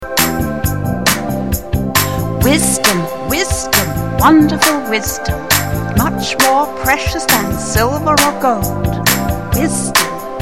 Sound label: Rap